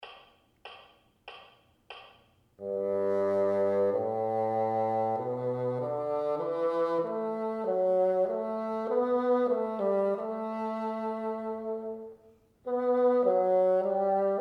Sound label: teacher, bassoon; Keeping your fingers directly over the correct holes and keys as you play F2 will help you; Music and the Bassoon